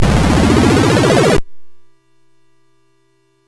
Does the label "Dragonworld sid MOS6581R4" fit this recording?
Yes